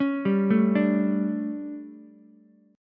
D Major 7th Suspended 4th, Ukulele; DM7sus4; arpeggiated; JGuitar

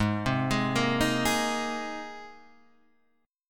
G sharp Major Add 9th G sharp; G#add9 Chord {4 3 6 3 4 4} arpeggiated

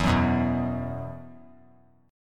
E Suspended 2nd, down; Esus2 strummed

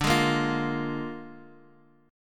Bb 7th D, chord

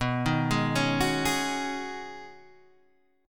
B 6th Add 9th B down Guitar Open G

